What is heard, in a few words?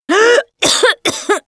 Cough, russian